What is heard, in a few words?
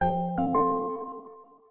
Startup